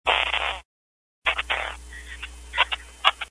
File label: puffpuff, farts